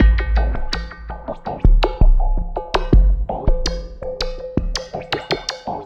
Drum Loop, Drum Loops

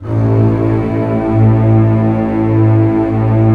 Orchestral f Slow, String Sections; Roland LCDP13

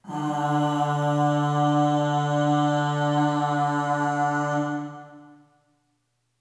Aah Long D2 Short Reverb; Harmonic Building Blocks

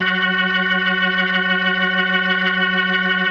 F#3F, B3, Vintage Keyboards

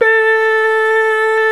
VOX, Male Scats

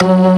ORG VK, B-3 ORGANS Vinta Keys B3

